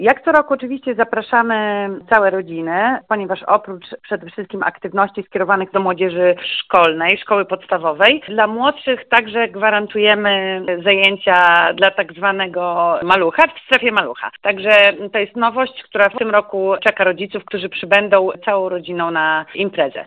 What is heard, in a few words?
techno; wariacje z nauka w parku naukowo technologicznym